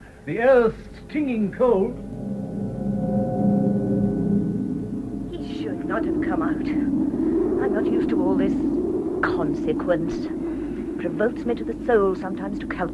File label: swell and wind